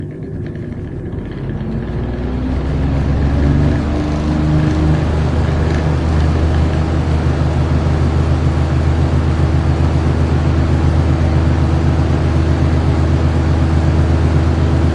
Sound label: Large Fan